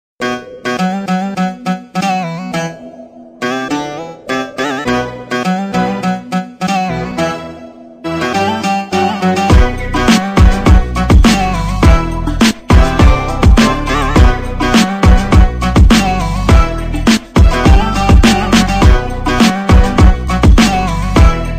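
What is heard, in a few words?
instrumental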